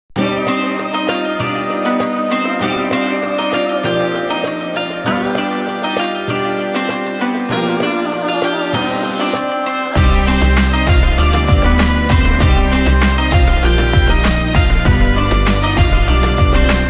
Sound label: Ramadan background music